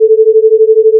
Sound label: ring beat